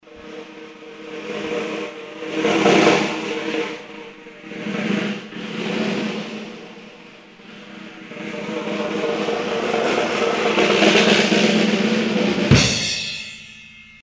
Circular Snare, Olofonia

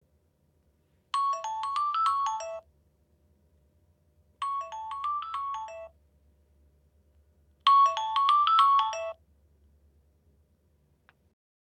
Vibes; olofonia

